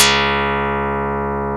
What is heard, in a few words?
HARP KARPLUS